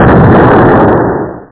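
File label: Explosion Long, tank; tanks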